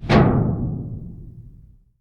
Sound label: Metal Tank